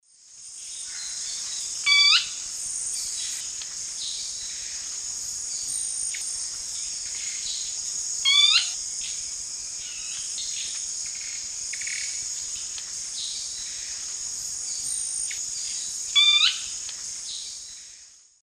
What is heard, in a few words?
Cnipodectes subbrunneus, song; S. America; Eastern Lowlands